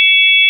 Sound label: sine; ものづくり基礎工学（情報工学分野）; 音の波形と分析